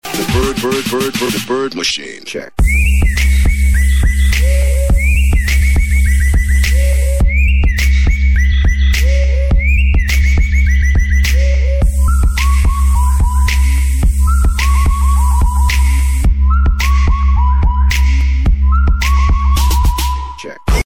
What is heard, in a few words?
whistle; valve